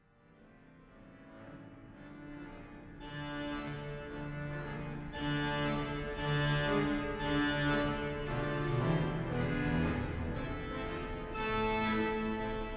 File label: Marche Pontificale; Hippolytus Lemmens